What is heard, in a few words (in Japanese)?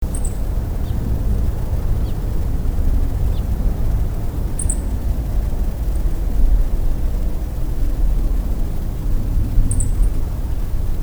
hojiro, tori